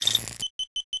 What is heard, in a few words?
battery low